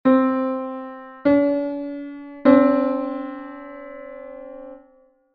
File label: 8 EDO